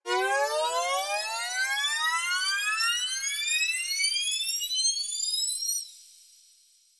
fx rise; sylenth

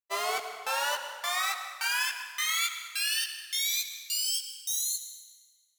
slow stutta

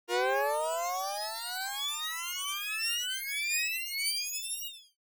sylenth no fx

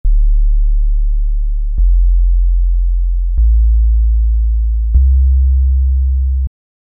z3ta long decay

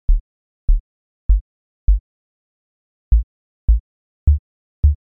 short decay

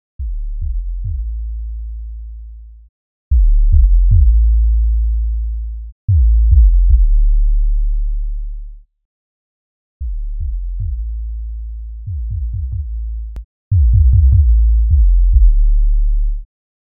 z3ta comp off on off on

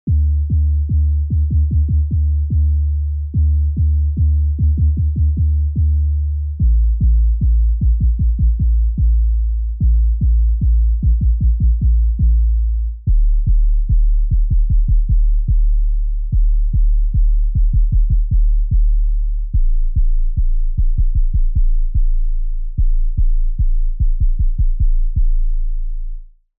wavetable

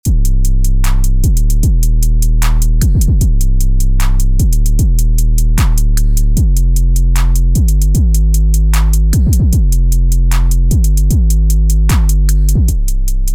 Virus Ti2 808

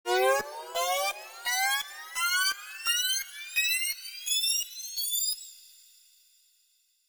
sylenth stutter

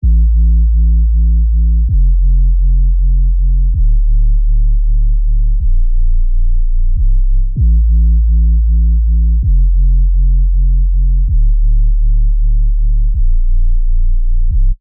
massive wobble

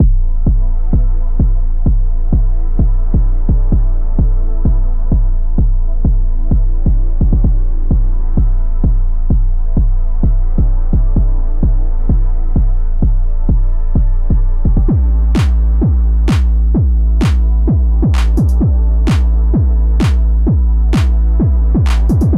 sylenth 808; demo

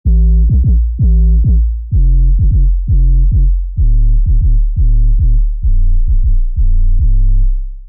massive kicks

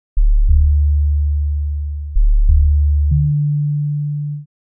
z3ta with filter

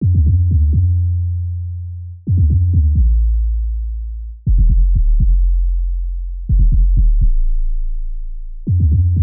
wavetable

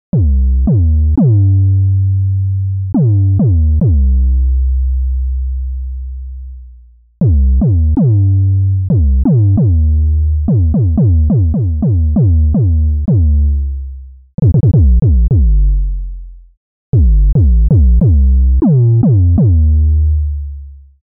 kontakt pitched 808

